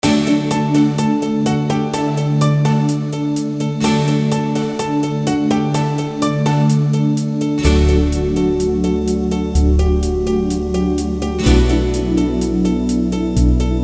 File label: schlager